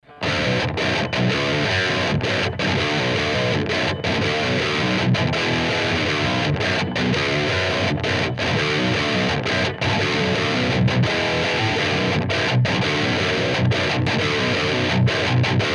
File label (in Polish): Eclipse LTD przester, 7string